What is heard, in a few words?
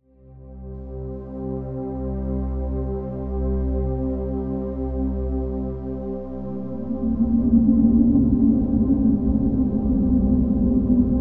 Ambient